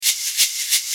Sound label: maracas